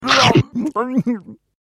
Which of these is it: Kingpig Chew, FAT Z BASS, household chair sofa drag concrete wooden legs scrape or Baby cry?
Kingpig Chew